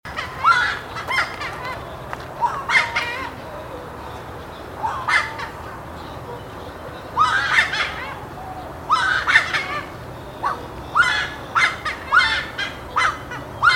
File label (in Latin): Aramides ypecaha